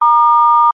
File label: household telephone DTMF tone star long; zapsplat